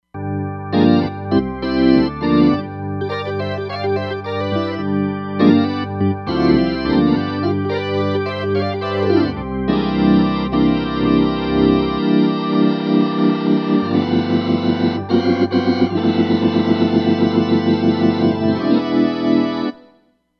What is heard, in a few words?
StakDraw Org, Roland Fantom XR